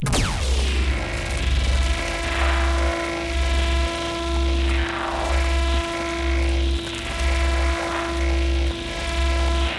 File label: mining laser